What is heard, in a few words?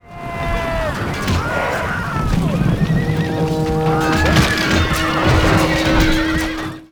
battle; rome total war